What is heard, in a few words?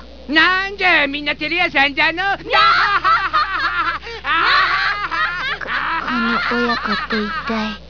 laugh